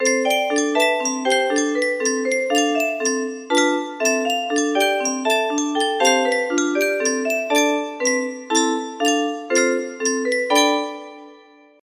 10 second timer, music box

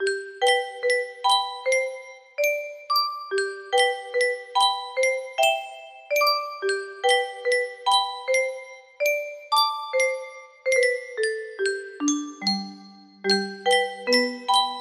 bass line, music box melody

